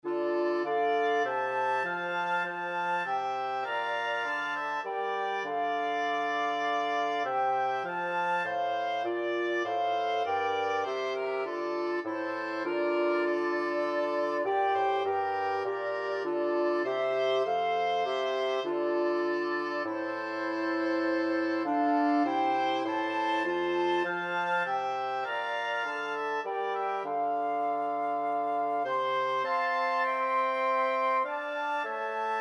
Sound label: flexible mixed ensemble, 4 players